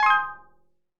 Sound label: kds order ready